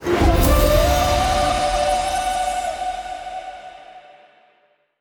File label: total win end; amazon-city-of-gold